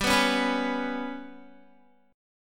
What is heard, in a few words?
G Major Flat 5th, down, Guitar; GMb5 strummed; JGuitar